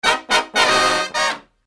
brass loop

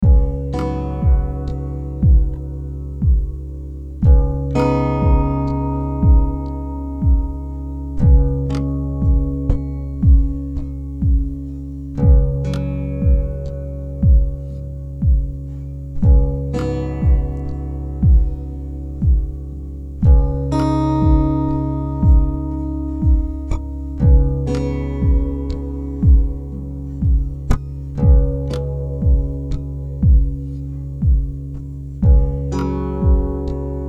C m 120 Acoustic Groove

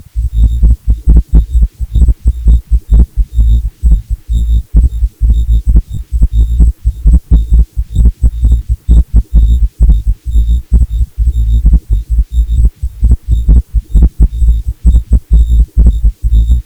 120 Noises Ambient Samples HEAD HITS

